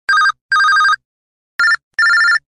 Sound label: land dual ring